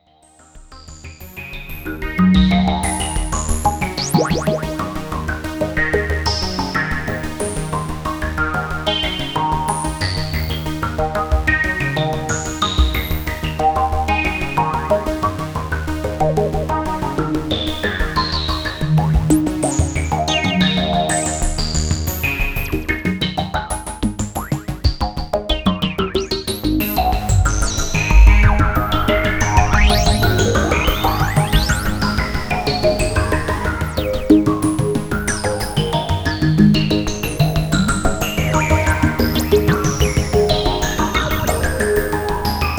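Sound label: JupiterX Jupiter8 Filter Mod Sequence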